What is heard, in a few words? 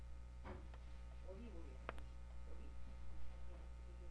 spray